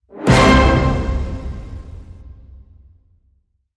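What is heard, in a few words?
Hit Orchestral Glam C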